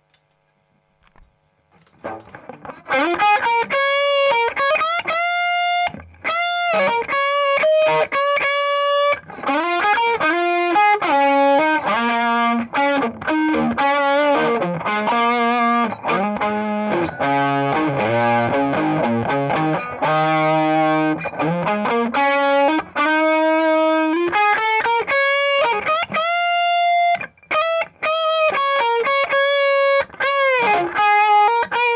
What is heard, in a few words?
es335; reverb